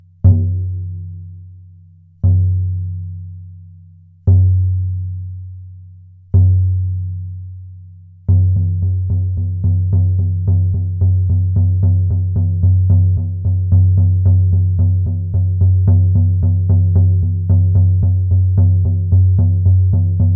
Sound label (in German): Kalbsound, Trommel